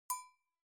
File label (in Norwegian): Klang